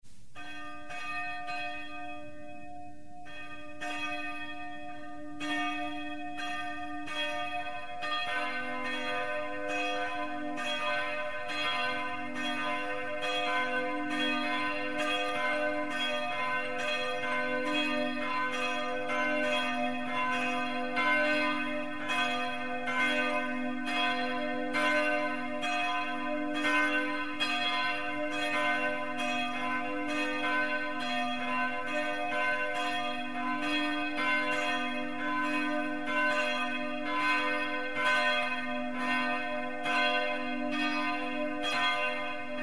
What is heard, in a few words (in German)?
Glocken Marienrode